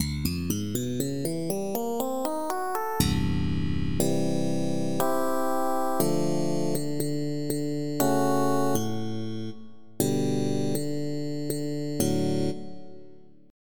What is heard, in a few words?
Slap Bass 1; FF7